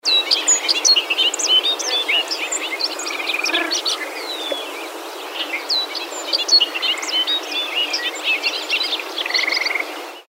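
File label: Bobolink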